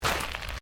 Footsteps Gravel Step; Crocs; Footstep Foley; Human

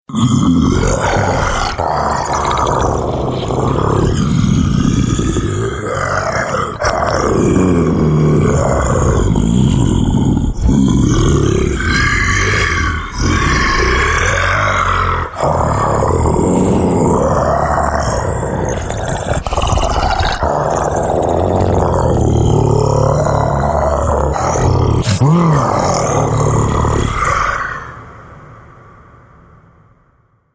Ferocious monster growling with cave ambiance; Monsters; Horror

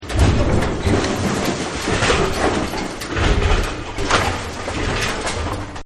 Impact And Crumble; Impacts; Combat

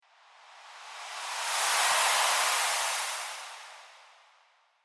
Flutter Whoosh Faint; sound design

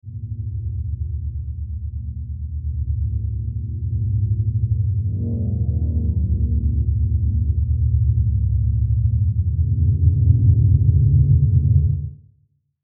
SWELL RUMBLE, Swell Rumble Low; Dark; Production Element Imaging Element Accent Transition

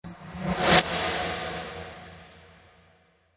Magical Impact Whoosh; Magic Sound Effects; Andrew Fleming

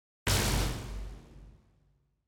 Hit Flange Front To Rear; Blastwave

